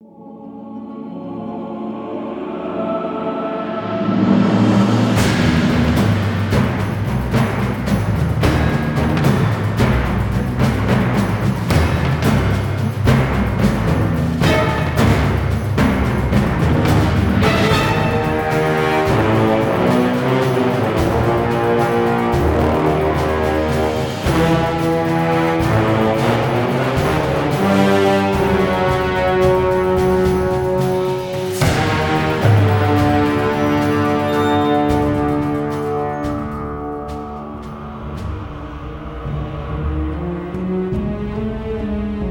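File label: background music; Pirates of the Caribbean Dead Man's Chest; PlayStation Portable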